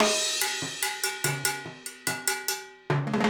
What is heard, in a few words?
Timbale, acoustic percussion loop; Drums & Percussion; Percussion Loops